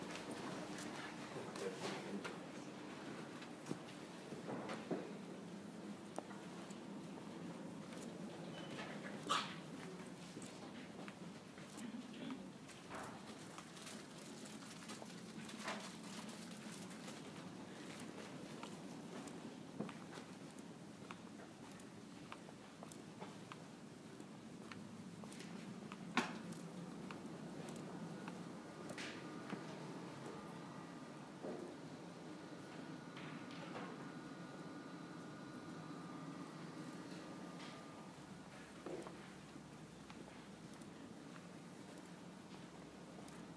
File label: Silent Floor